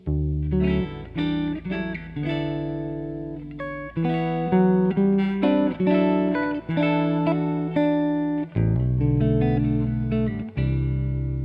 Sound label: Oh Danny Boy 8 String, guitars